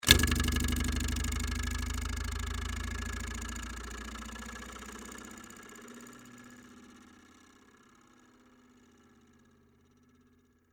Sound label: Door Stopper Move, Texture, Door, Stopper, Movement; Miscellaneous Doors; BlastWave